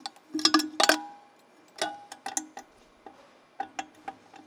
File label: clank foley rhythmic; daily samples